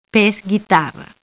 Sound at guitar

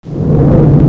lion; PUZMANIA

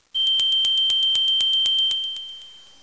ring; Sound Library 1

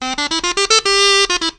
crum toot, Musical Instruments

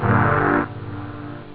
muddy, synth chords

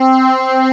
metalpad; cubic

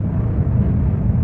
THRUST

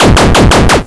lock on; Deus Ex Machina; weapons; homing

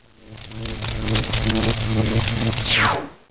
Arc Zap Build

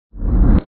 eng hum up; Lander

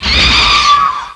Thief Death; Descent2

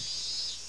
weird sound

